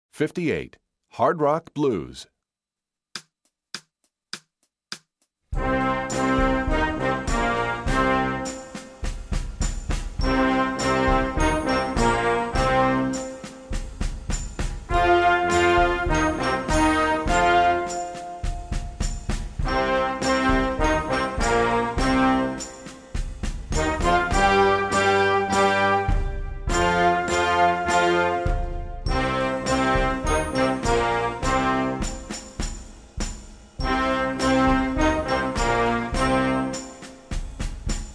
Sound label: hard rock blues